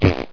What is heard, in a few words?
explode; npc